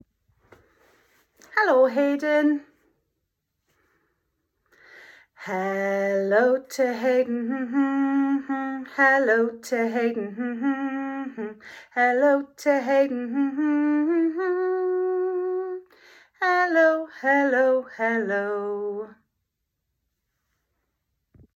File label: morning song